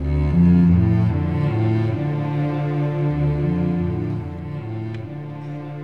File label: bass basic cable, Loops, Sad